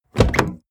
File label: car bmw e46 exterior door front open short; Cars